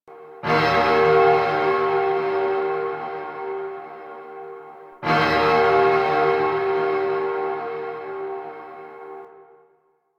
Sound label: Vault Alarm; Ambience; Orokin Moon Spy; Warframe